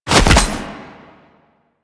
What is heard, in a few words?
Glaive Catch; Enemies; Weapons; Warframe; Teshin; DE